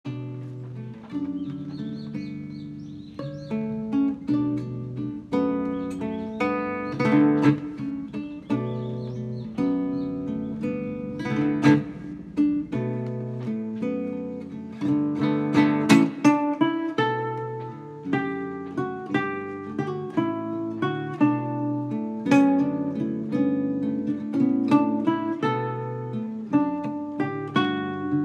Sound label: Guitar duo